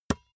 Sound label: basketball dribble tap hard; sport freizeit